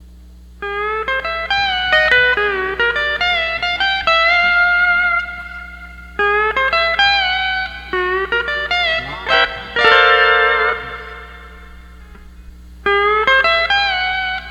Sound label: 12 String Lap